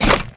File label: rail charge attach; MOTS